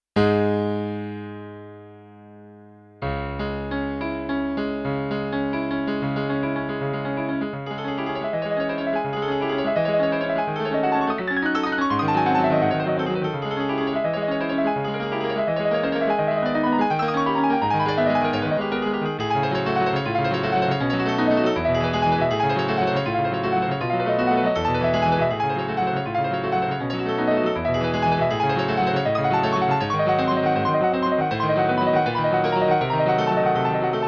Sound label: Dark Grand, piano; ProFormance